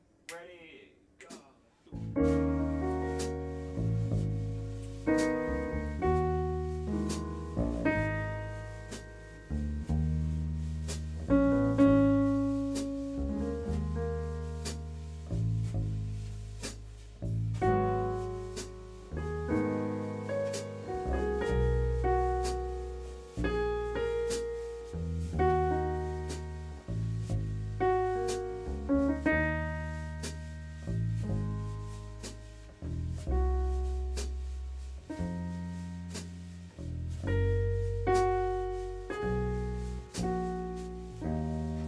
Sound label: Piano, jazz